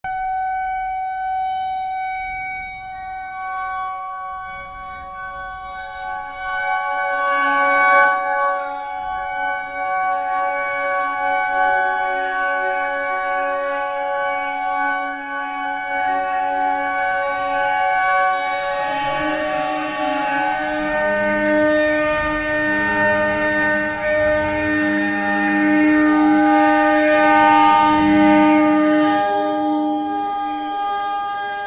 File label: sound begin as a horn